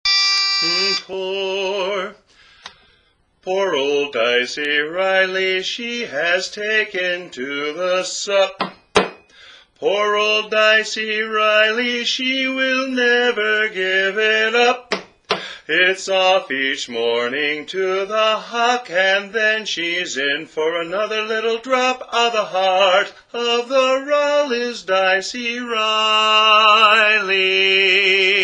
lead; Dicey Reilly

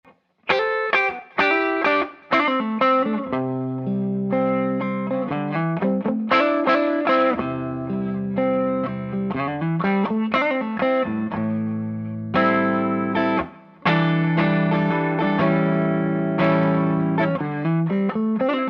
Bluesy Strat; Lil Monster 6

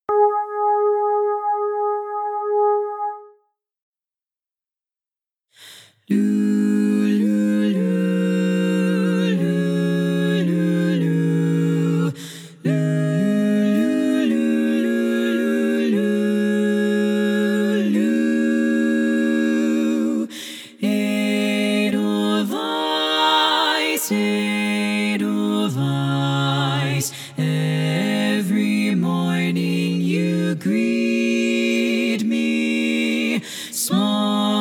bass

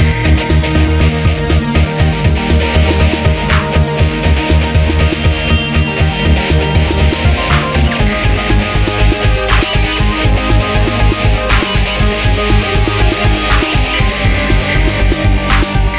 Music

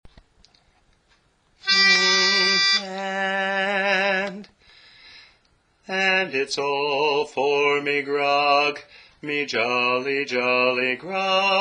All For Me Grog (Bass)

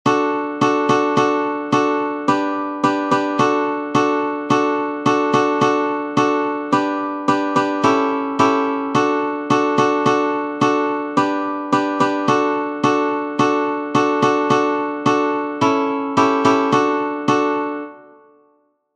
Normal Strum; Coulter s Candy

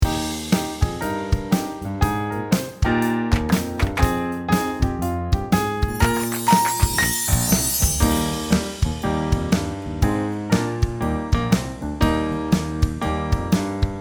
Trax no melody; Good Morning Song

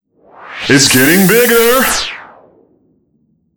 Air Med

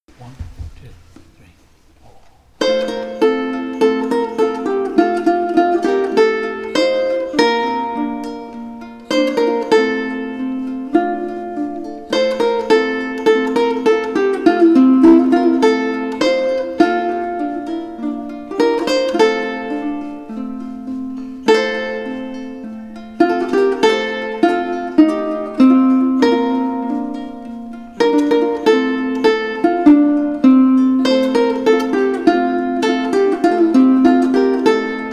Uke

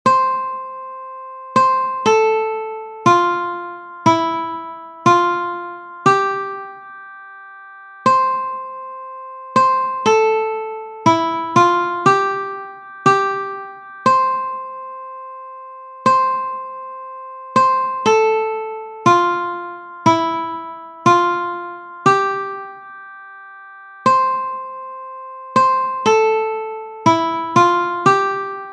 Uke Slow Melody; Shepherd's Hey

